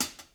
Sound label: Closed Hat Natural Double; Lost Tapes From Mars